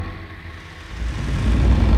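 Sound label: Music Volcano Light 120; From Dust; PS3; Xbox 360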